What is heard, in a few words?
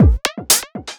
Subtractive Beat; Sonic Foundry (Sony Creative Software)